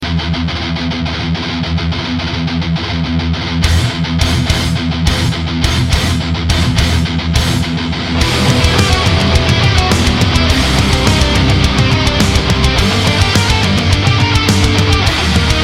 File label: Death Metal